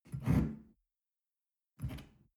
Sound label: shell grab from tablecloth soft; soundpool